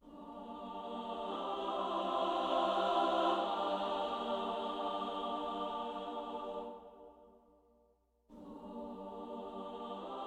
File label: Tutorial Choir